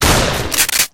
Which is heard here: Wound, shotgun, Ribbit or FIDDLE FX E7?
shotgun